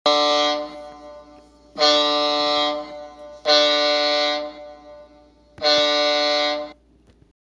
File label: fog horn